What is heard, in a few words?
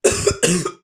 cough, creature